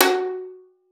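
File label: violin pizzicato F#4; Xsample VOL-5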